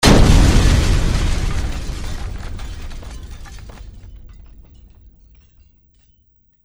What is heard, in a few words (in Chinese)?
航弹落下爆炸; Warcorrespondents